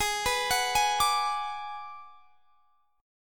Ab Minor 7th Flat 5th Ab; arpeggiated; x,23,21,23,21,22; down

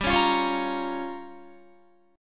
G Minor 7th Flat 5th, Guitar; Gm7b5; strummed

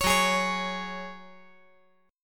Gb Suspended 2nd, 5G,4C,5E,5A

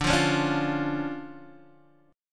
D Minor Major 7th D Guitar DADGAD

